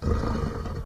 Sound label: wolf hurt; minecraft; technic